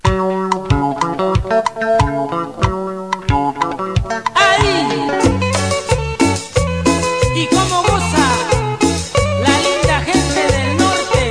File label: cumbia